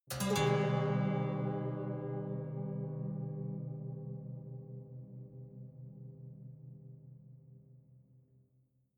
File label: haunted strings chord minor